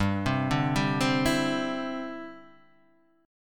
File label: D 7th Suspended 2nd Suspended 4th, G